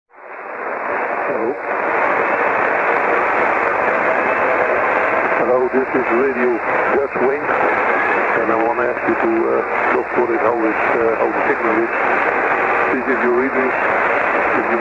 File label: radio; 6425khz; radio dutchwing; kortegolf